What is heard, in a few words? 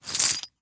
coin